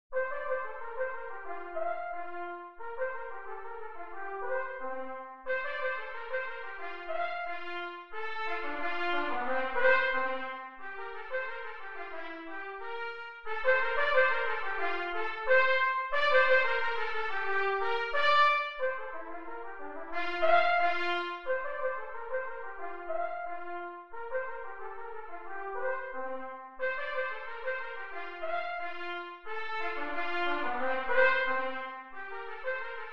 gavotte; gossec francois joseph

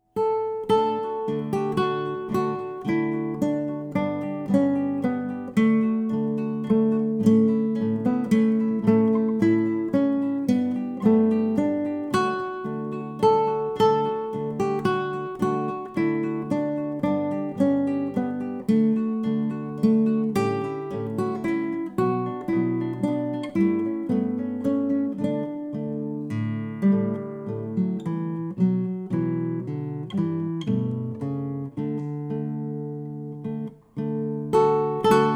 away manger melody

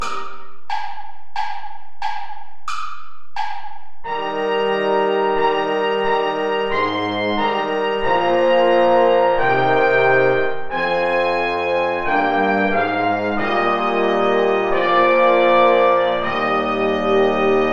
play along without trumpet; praetorius michael dans une table obscure

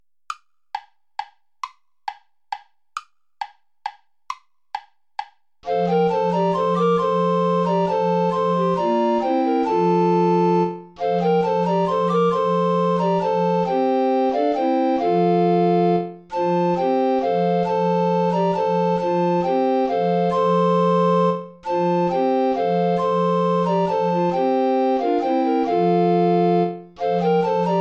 volta play along without alto recorder; praetorius michael

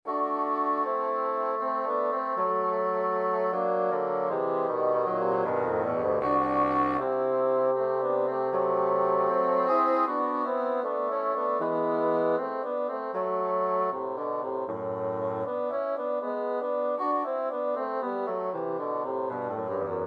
divertimento for three bassoons andante

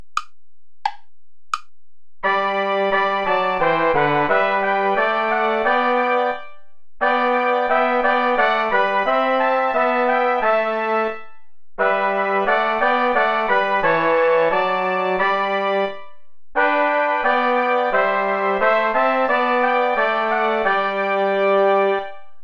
play along without trombone; goudimel claude; sus serviteurs seigneur

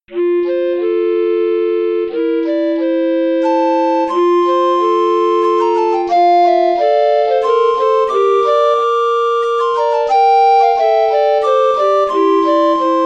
waltz; waterfall

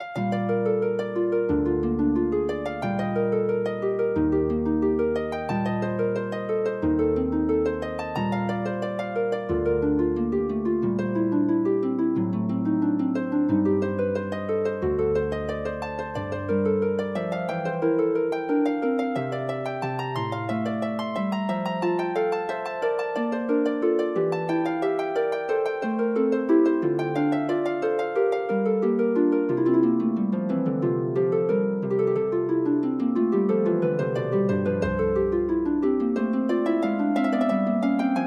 allemande in bb major for harp; bach johann sebastian; from partita no 1

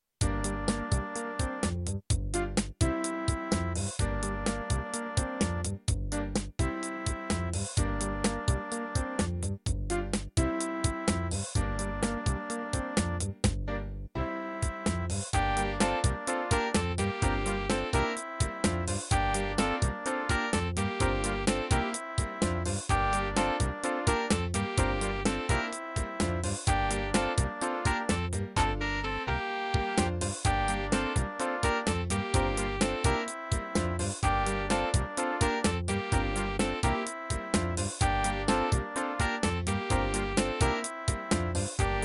sax quartet ritardando; que estnos matando

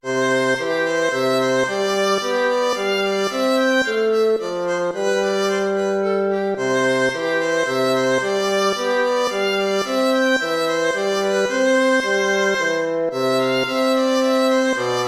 tempo minuetto; hook james

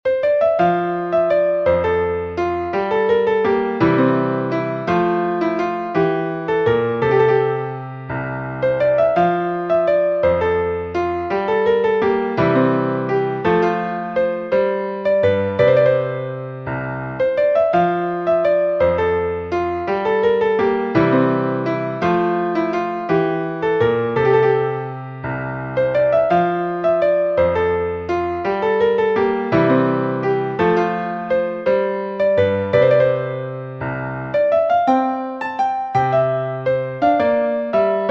jigg gigue

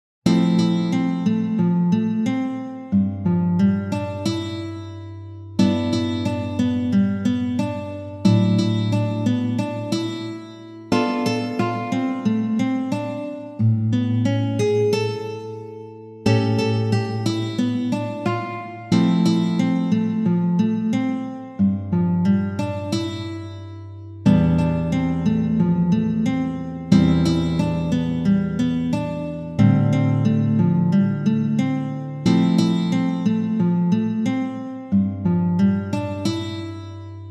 guitar; belle indifference

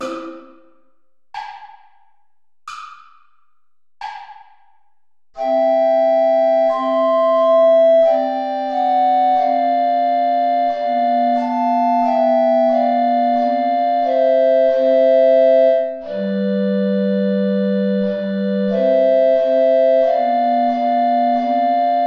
play along without tenor recorder; mozart wolfgang amadeus; ave verum corpus

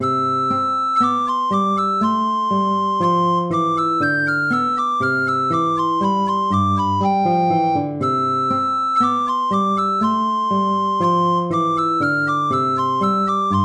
allegro; hook james

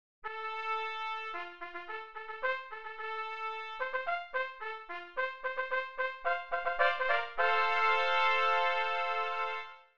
fanfare

